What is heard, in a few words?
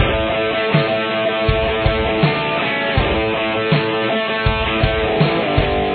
verse guitar